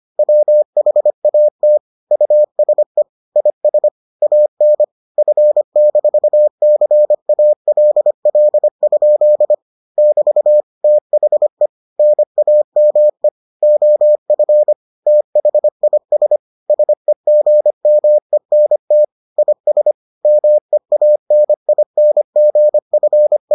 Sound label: morse